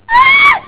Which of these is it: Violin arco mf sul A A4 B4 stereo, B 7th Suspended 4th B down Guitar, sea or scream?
scream